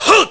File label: Gauntlet Attack; Byrne; zelda